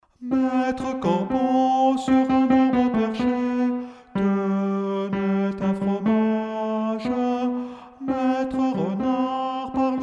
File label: tenor; LE CORBEAU ET LE RENARD (ténor chanté)